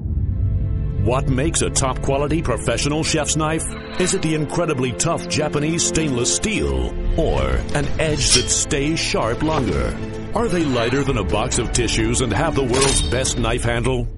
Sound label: Chinese Ringtones